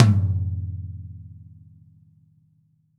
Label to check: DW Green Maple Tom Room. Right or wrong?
Right